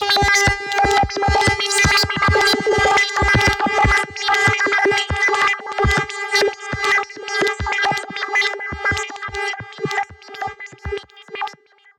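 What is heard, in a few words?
Modulation Proc, alarm siren samples, 120bpm; musicradar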